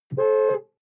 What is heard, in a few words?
sports car int horn single; verkehr transport; autos